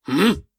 shield

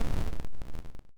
explossion hall; Eleven: Stranger Things The Game

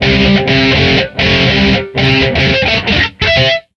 Guitar biff blues wank; Back to the Future: The Game; It's About Time

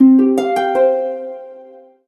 Solo Harp Positive; Kitty Nigiri

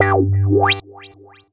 Bonus

